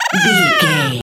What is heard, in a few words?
goblin fairy expression emote